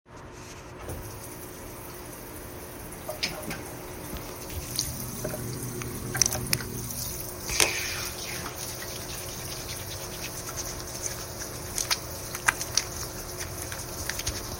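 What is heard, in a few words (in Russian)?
мыть посуду вечером у себя дома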